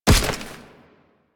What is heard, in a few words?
To Feet; Archwing To Roll; Warframe; Rail Jack; Archwing Deploy